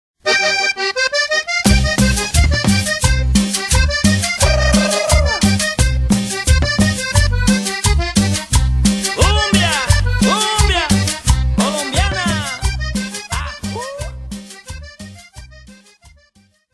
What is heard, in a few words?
Cumbia Audio Snippet